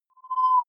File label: sci-fi lights on tonal